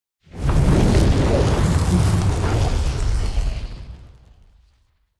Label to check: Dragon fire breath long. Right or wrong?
Right